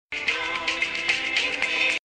Mixture Sms

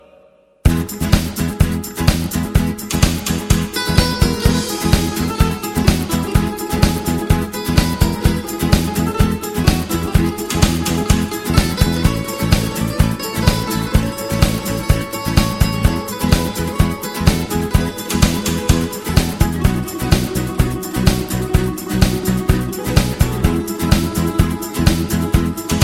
Croatian Beautiful

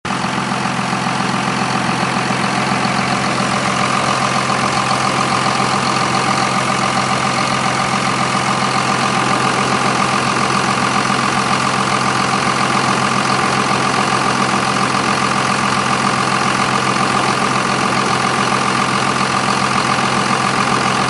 Cummins Diesel Idle

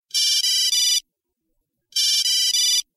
electronic

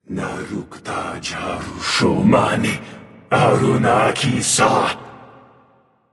Chanting; Anubis

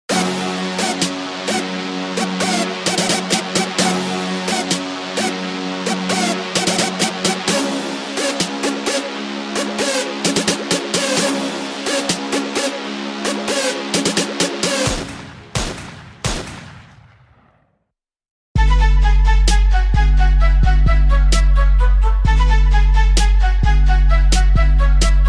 ELECTRO ANDROID

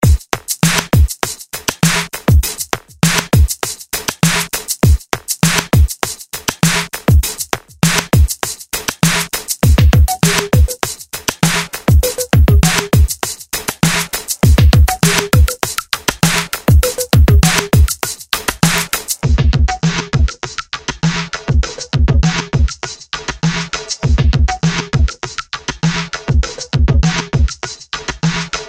Hiphop Boy

